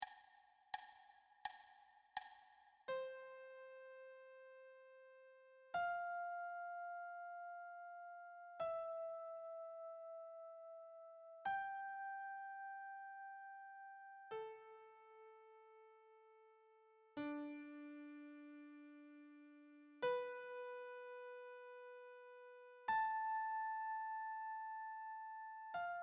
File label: C whole notes solo